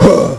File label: Attack; Dark; Clash